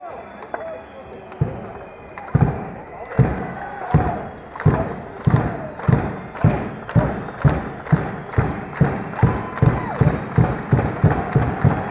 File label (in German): trommeln und klatschen